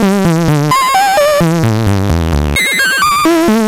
Juno-60 Arp, 130BPM

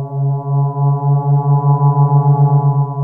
JUP 8 B3, JUPITER 8, Rare Synthesizer; Hollywood Edge - Giorgio Moroder Rare Synthesizer Collection

